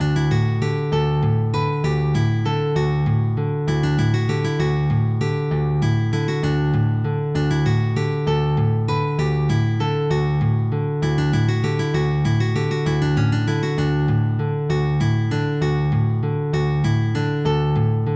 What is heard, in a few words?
Saltarello; Equivision: Polo Thrills